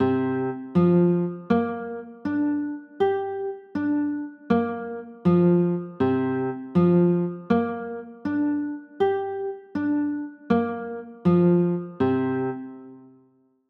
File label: c 6, chords